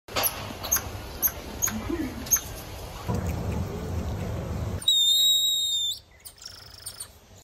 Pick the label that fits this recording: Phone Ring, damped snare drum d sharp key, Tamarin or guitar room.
Tamarin